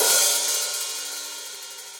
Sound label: Hihat Open Shank Steely Tight; Drum Hits; Standard Hits; Ableton; Factory Packs; Session Drums Studio